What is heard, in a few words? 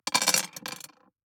DICE on Glass, Throw and Roll, Standard, 4 Four Dice; Samples; ArticulatedSounds; DICE Sfx Party (Extended)